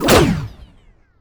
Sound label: Pickup Scifi; SFX Kit